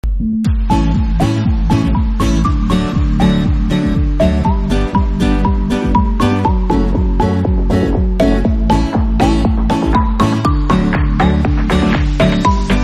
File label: reggaeton